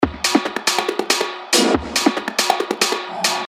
techno loop 140 BPM; Trojan; revealed techno top loops vol 8

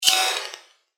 Achaemenid, percussion; revealed percussion vol 2

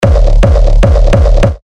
Kick D; Kronos; terra kicks; Revealed Terra Kicks

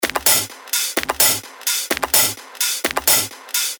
128 BPM; Revealed Top Loops Vol. 4

